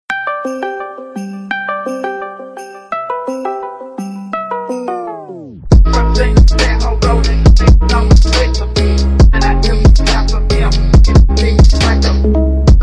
Phonk Remix; Discord; ringtone

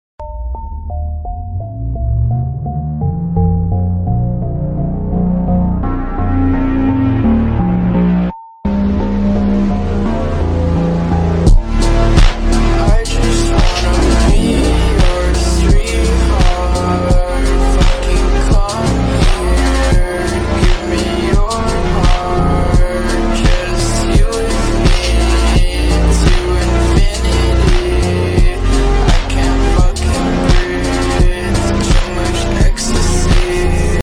Slowed; ringtone

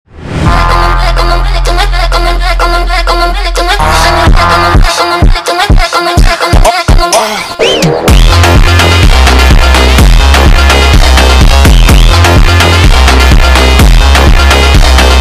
Funk sped Up

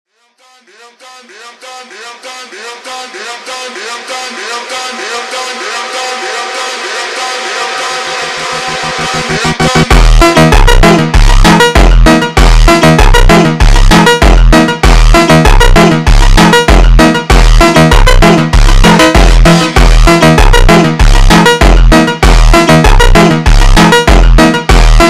MONTAGEM Super Slowed

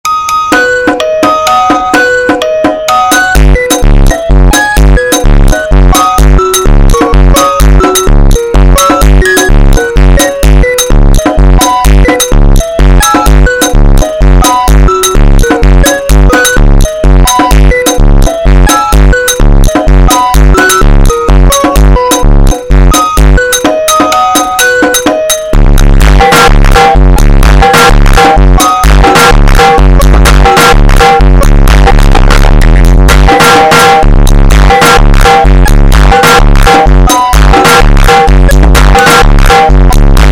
Sleep Phonk

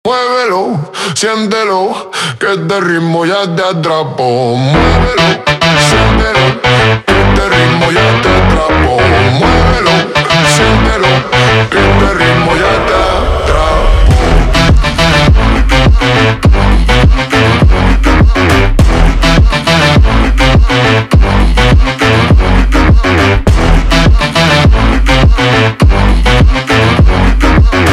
slowed, phonk

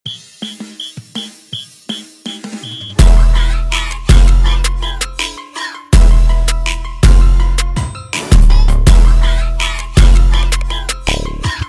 Drill Sped Up

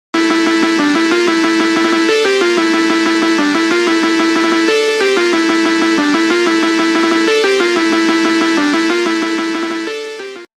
Phonk Melody; ringtone funk; آهنگ زنگ فانک

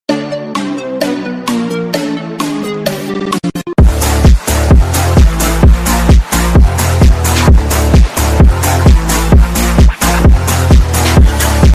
Phonk remix; KAHOOT Kahoot; ringtone

